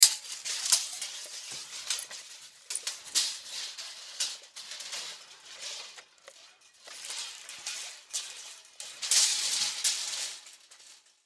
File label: Tape Measure Pull Tape Out Long Rustle; Hand Tools; INDUSTRY; Blastwave